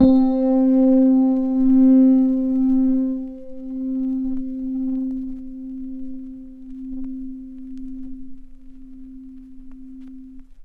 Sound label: DX100 New Electro Vinyl Synths C3; vinyl keys